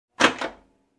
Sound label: Door locking